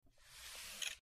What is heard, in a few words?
Short Squeaky Mouse